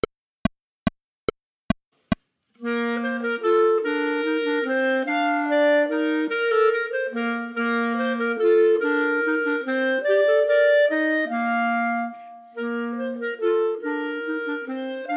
Minuet, Chamber Music for 3 Clarinets, minus 1st part; Minuet II; Partita in F; Chamber Music for 3 Clarinets Vol I